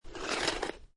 Snow And Ice Ice Skate Slide; Weather

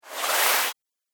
Thin And Sticky Whoosh; Whoosh Sound Effects; sound design

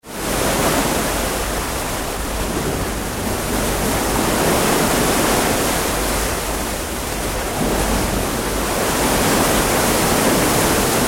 Ship Oil Pipeline Service Vessel Sea in Moon pool; Tom Hutchings

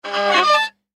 Violin Play, Violin Fast Stab Tension; Cartoon; Musical; Blastwave

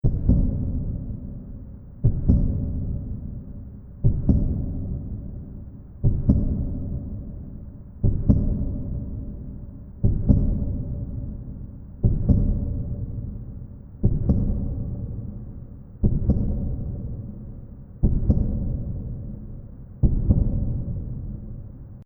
Frankenstein Heartbeat; Monster Sound Effects; Horror Sound Effect

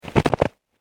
Snow Foot Step; human

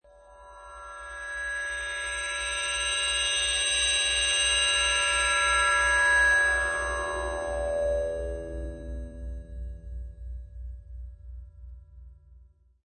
Mindscan, Sci-fi, resonant scanner sound; or scifi magic transportation, tranformation or teleportation device

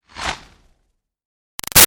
Arrow By Ruffles Slow; Bows and Arrows; Sound Effects:Combat; Big Room Sound

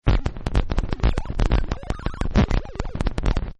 static; Buttons; Multimedia; Partners In Rhyme

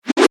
Whoosh Double, Whip, Transition; Production Element, Imaging Element, Accent; BlastWave

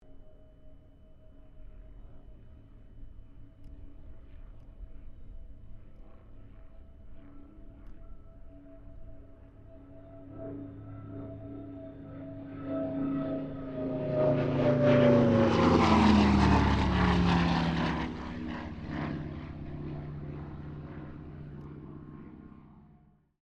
AIRCRAFT CA-13 BOOMERANG PASS STEREO; Airplanes; Vehicles

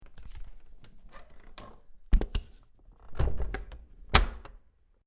Closing Wood Door With Metal Door Handle

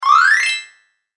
Up Bright Game Sound; Video Game Sound Effects; electronic sound effect; beeps, bleeps, blips; Andrew Fleming

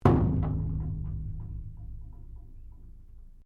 METAL IMPACT; Materials

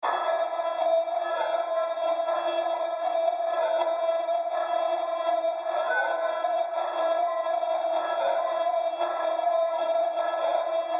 Scrambled Radio Signal Tuning Static; Scifi Technology; Noise; Electronics

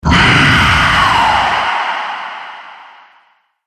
Ghost Monster Shriek; horror; Ghost Sound Effects

